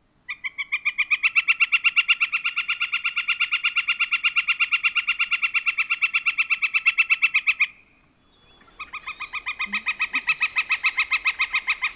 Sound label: flicker northern